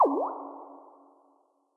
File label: water